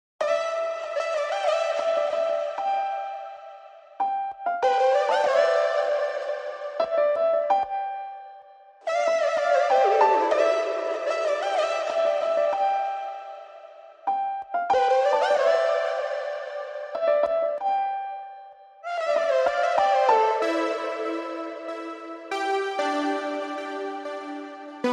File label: Lead Motif Only